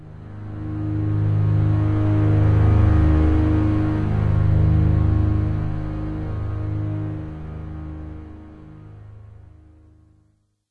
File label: swell